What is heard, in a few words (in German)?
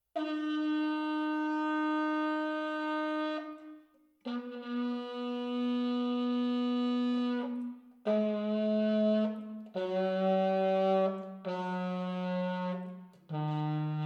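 alt, Alla tromba, Einzelne Töne gehalten; legato mit verschiedenen Tönen. Alt; saxophon; spieltechniken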